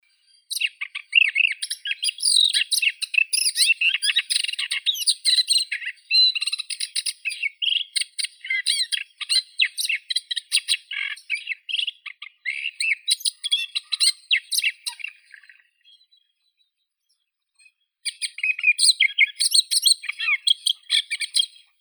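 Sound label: moustached warbler, Acrocephalus melanopogon; kamishovka tonkokluvaya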